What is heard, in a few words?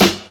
short steel snare drum sound g key, steel snare drum tuned to the G note; snare drum samples